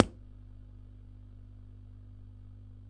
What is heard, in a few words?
AMP NOISE; 1000fx